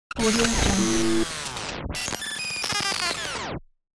radio digital rewind glitch

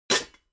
object kitchen pots stack

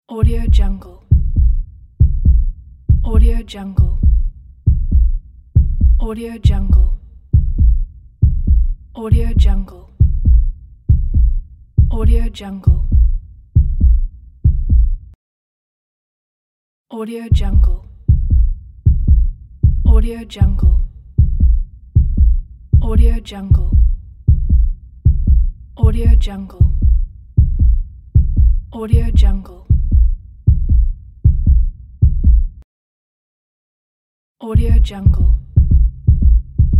heartbeats

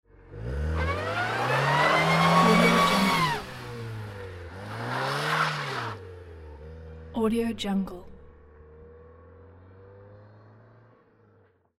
car wheelspin

